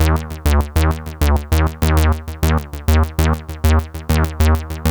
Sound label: XPANDER; AMG - Vince Clarke Lucky Bastard